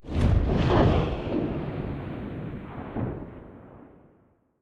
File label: tail; smite; cthulhu